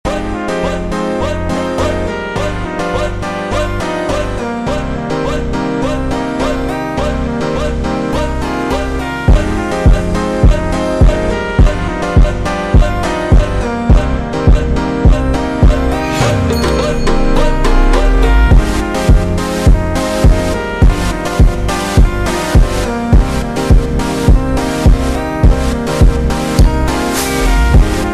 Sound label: Montagem slowed Reverb